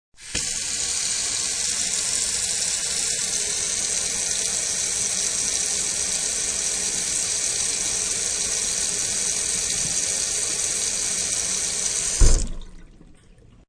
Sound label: Kitchen sink is turned on, runs moderately, and then is turned off.; Kitchen (Sound Effects:Household)